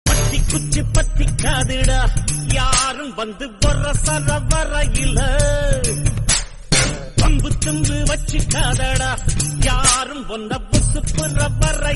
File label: Tamil Ringtone; Dheena